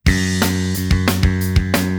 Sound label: music